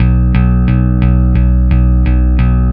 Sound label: Bass Drum Kicks